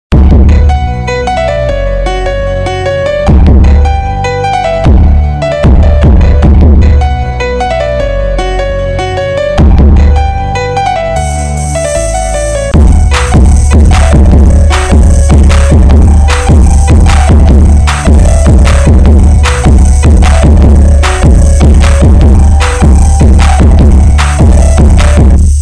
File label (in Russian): melodiya na zvonok dlya telefona